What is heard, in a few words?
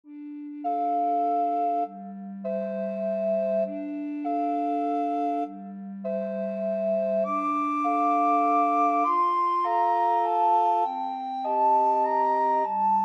gymnopedie, recorder quartet